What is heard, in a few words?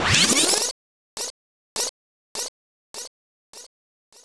rewind, Drum N Bass; Sonic Foundry (Sony Creative Software); Knutrix Drum & Bass